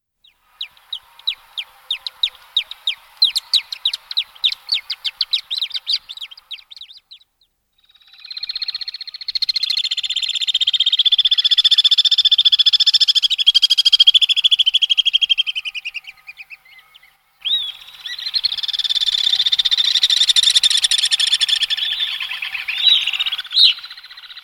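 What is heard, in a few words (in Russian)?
Strij belobryuhiy, alpine swift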